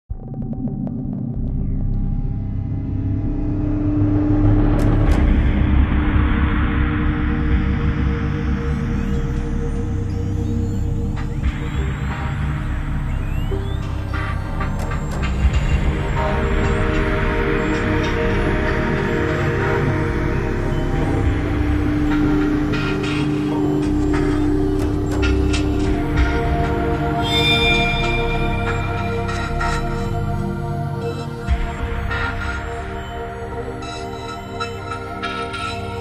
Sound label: Creepy Thing, ambientscapes